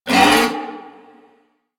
Ram Pain; Animals; DE; Warframe; Quests; Duviri

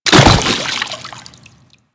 Bodyfall Water; Warframe; Kubrow; Bodyfalls

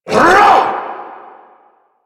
Vocal Attack Short; Warframe; Duviri; Dax; Vocal Set A

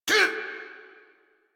pain; Warframe; Tyl Regor Boss; Efforts

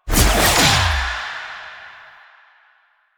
Choir Pool Cast; Warframe

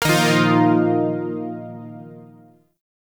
Shawzin Blues Chord I; Music; Warframe; Zariman Shawzin

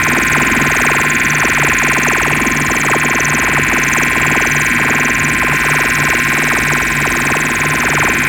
Shock Rifle Laser Loop, weapons; Warframe; Corpus

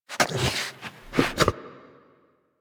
idle alert clean hind, Kubrow; Enemies; Warframe; Tame

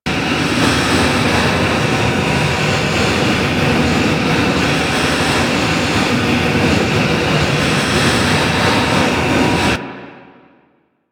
Void Dragon Orb Projectile Loop Poison; Enemies; Abilities; Warframe; Duviri

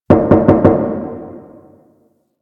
Ghost Cargo Knocks; Ambience; Props; Warframe; The New War; Zariman; Quests

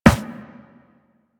Run Metal, Footsteps; Warframe; Operator

